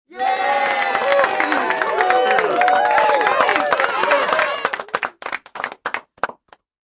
Crowd Cheering And Yelling, Medium size crowd, studio audience: Cheer. Clapping and cheering; Applause